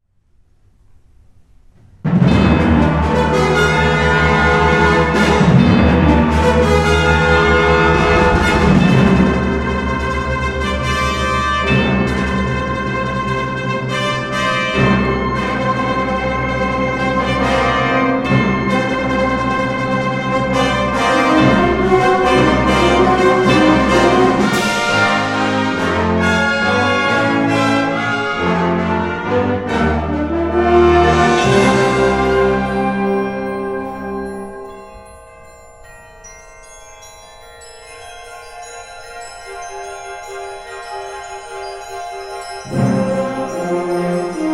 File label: Fanfare